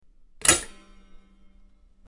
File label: Toaster